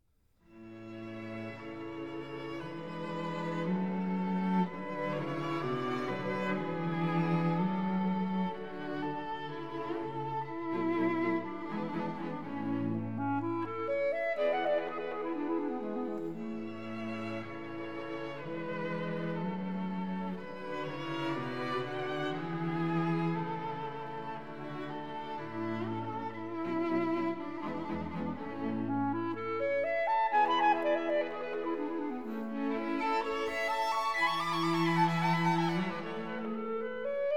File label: Clarinet Quintet first movement; Mozart; Legend of Galactic Heroes; Free Planets Alliance SIDE